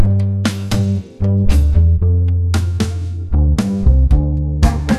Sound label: add bass